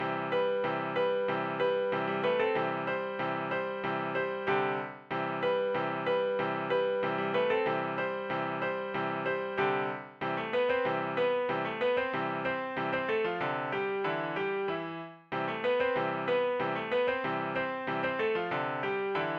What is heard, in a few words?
Light Piano Retro Loop 110bpm